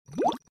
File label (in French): Bulles